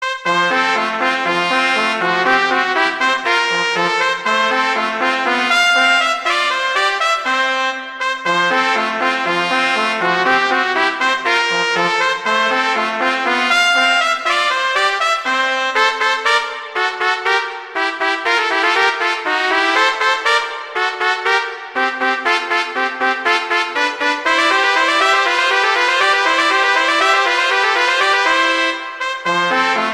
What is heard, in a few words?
Duet; Mozart; Duets